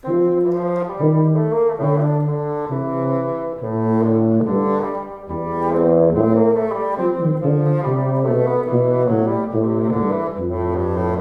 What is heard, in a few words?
Fagott and Violoncello; Stucke fur Fagott and Violoncello (I.); Paul Hindemith; umass recitals